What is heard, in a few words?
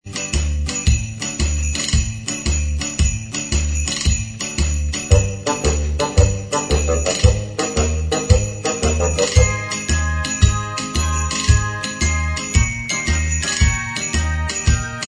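instrumental, backing track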